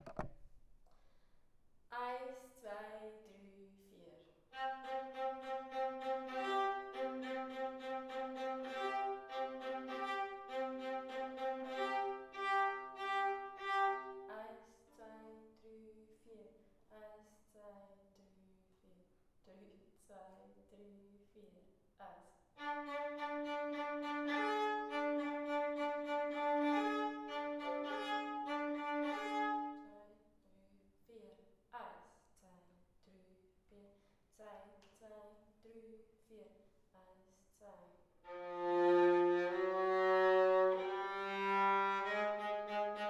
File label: viola; Kinderorchester; Hühner und Hähne